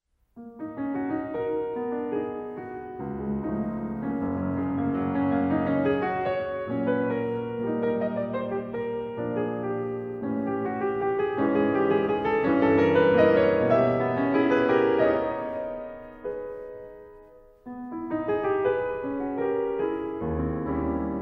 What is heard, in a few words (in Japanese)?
チェロ & ピアノ